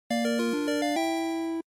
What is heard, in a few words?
NES General Clear2